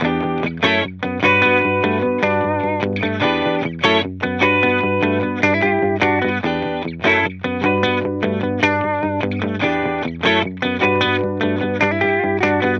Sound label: guitar loop 150 Bm; Pop; Guitar Stacks; SD PASADENA Pop Sample Pack; Slate